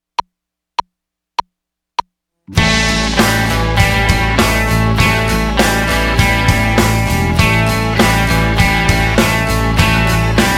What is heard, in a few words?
Straight Eight Blues